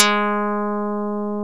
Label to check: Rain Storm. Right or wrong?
Wrong